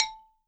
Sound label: balafon a3; WORLD INSTR 2; WORLD PERCUSSION